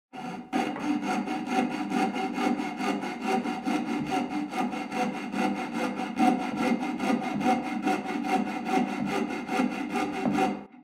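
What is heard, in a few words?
jigsaw sound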